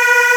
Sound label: Aahs Db3